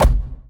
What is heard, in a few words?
ball collision geo low